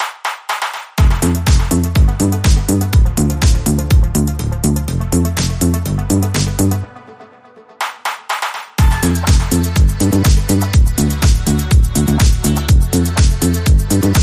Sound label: Electro Clubbin, Rhythm; 30sec